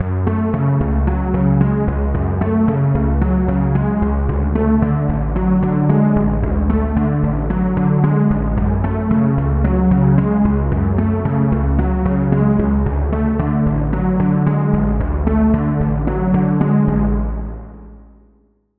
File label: Key; Routed Sound; Serum 2 Expansion - Street Vanquisher Vol.1